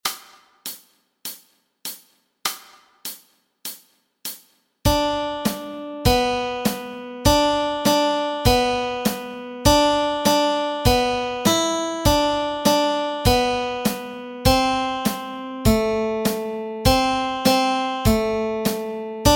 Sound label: Rain Rain Go Away melody